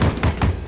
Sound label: knock